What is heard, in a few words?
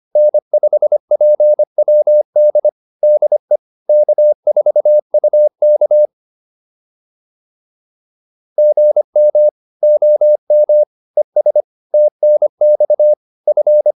QSO, intermediate practice files